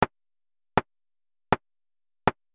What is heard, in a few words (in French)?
Synchro 4 tacs